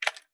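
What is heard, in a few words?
gear rattle weapon medium; viper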